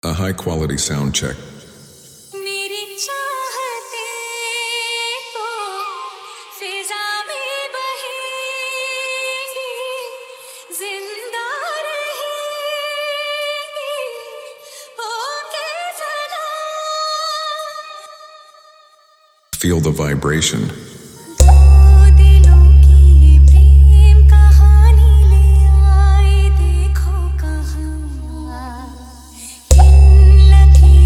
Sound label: High Qulity Sound Check Hindi Song FULL VIBRSTION